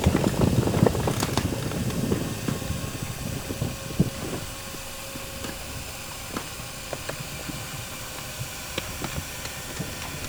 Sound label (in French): bateau; NORTEK